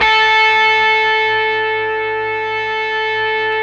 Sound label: GTR, Dirty Buzz; Club-50 - Foundations Roland